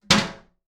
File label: Household Toilet Drop Seat